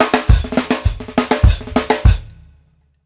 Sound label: OPEN HAT L, Drumworks FILLS 96; Sampleheads - New York City Drumworks VOL-1